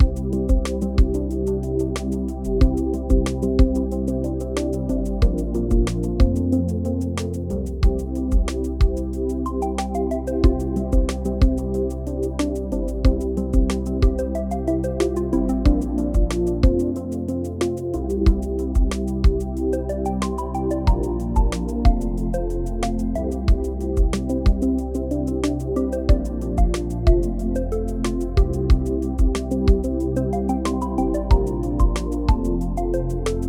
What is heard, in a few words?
music home